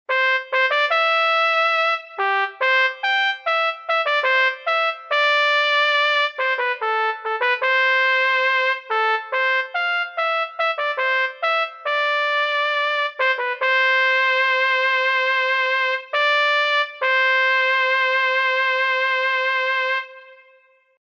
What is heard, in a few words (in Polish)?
hejnal